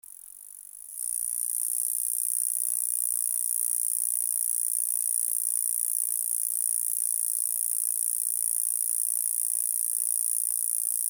Gampsocleis glabra, Thermenlinie

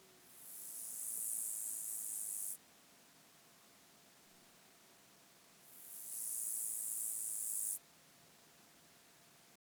Aeropedellus variegatus; Fisser Joch

